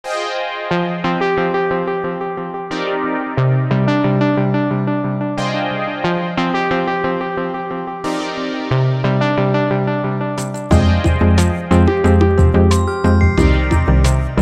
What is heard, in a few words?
Neon, ringtones; Huawei P30